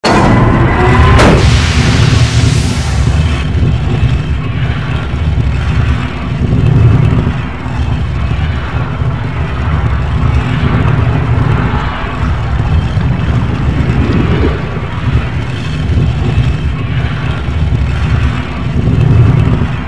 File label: roll